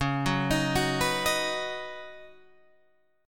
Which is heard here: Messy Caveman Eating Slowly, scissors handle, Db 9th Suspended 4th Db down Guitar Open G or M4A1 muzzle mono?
Db 9th Suspended 4th Db down Guitar Open G